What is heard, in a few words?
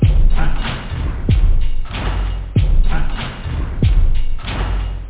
core, loop techno